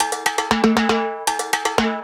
118bpm Perc Timbales Loop Snap

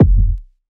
kick one shot; TROPKILLAZ

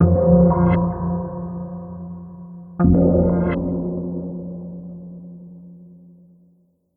F-Major Atmospherics, 172, with tail

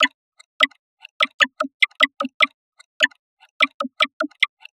100 hihat loop horses gallop fx; THELABCOOK

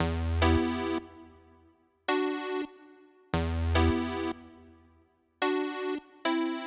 Em 144bpm pluck; Drippy Drill Melodies

